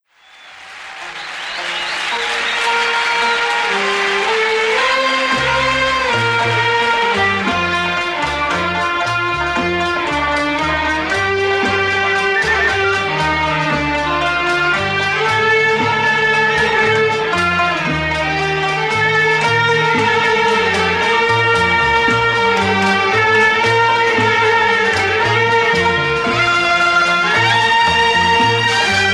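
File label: Ajam, Arabic music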